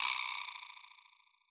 Percussian, Yamaha RY20

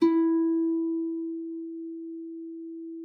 HARP PIANO D#4; Xsample VOL-8

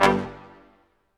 BRS Quintet staccato, Symphony Orchestra; Roland LCDP08

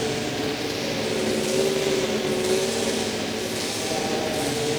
Cracklature, sparse soundscape

